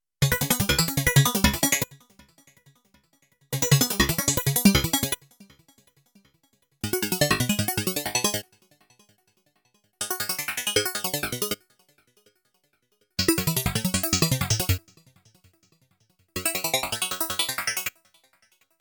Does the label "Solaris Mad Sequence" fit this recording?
Yes